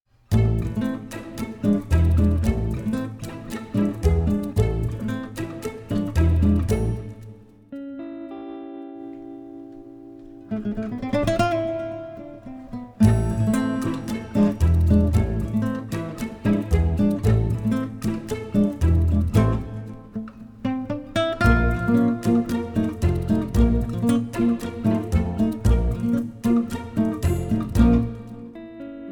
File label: Tango